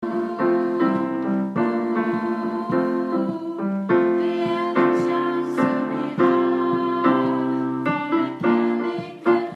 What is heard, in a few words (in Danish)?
2. gang alt